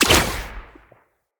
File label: Bow Shot Charged; Weapons; Player; DendritBow